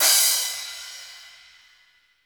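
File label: GATE cymbal crash; Crypton - DrumBase5000; GATE KITS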